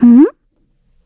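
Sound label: Vox Sigh, Korean; Estelle; kingsraid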